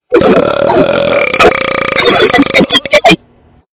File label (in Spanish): Arroto